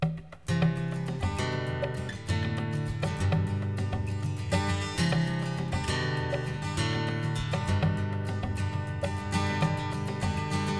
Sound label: instrumental chorus